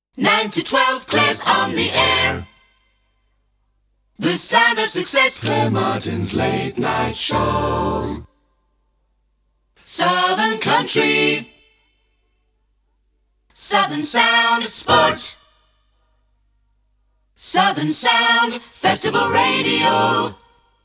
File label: Sport Festival Acapellas; Southern Country, Sport and Festival Radio